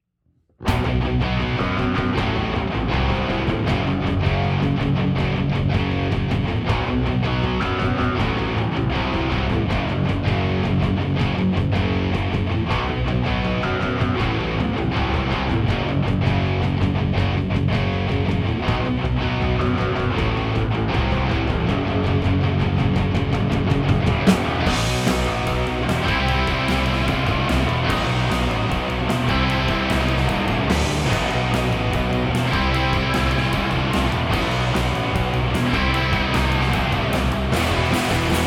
w Guitars

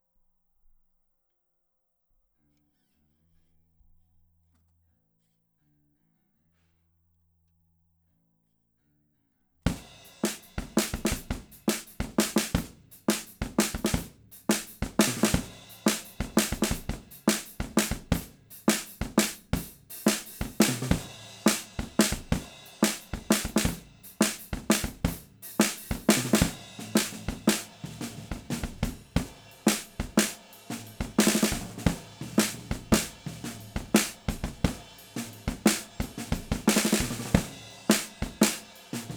PGA98 SNR Drums

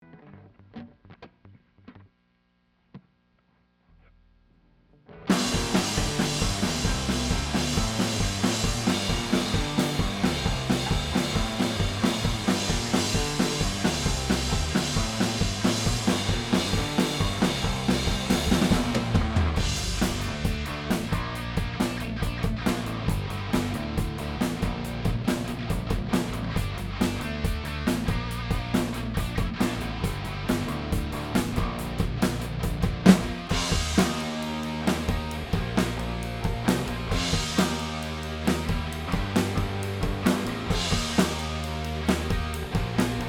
Drum Stems

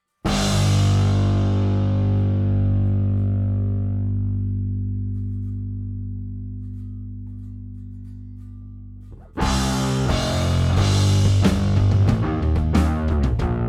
Drums and Bass

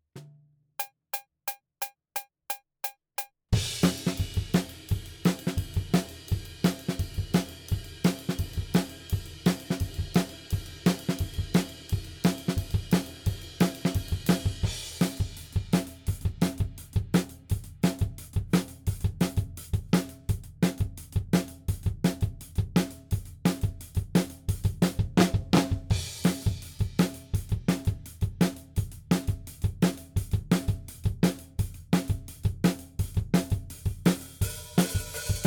Drums ONLY; Pre Pro

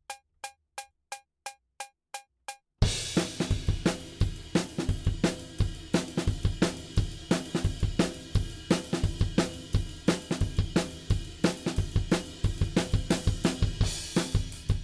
Drums ONLY; Pre Pro

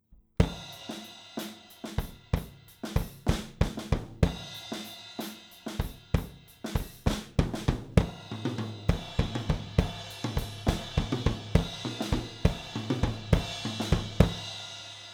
AKG414 Drums

